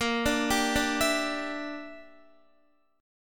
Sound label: A sharp 6th Flat 5th chord, Guitar; A#6b5 arpeggiated; JGuitar